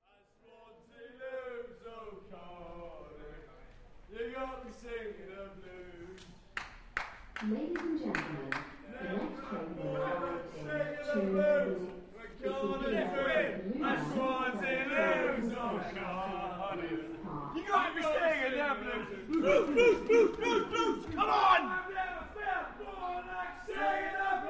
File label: cardiff city fans oxford circus tube station